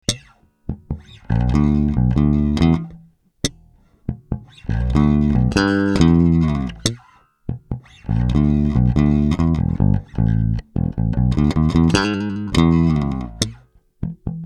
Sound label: Fender Jazz bass; JD-XLABs X100